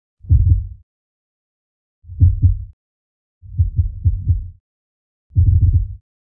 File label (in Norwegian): gjedde